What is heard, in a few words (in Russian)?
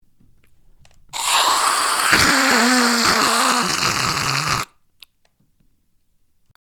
zvuk monstra ili sushestva shipyashego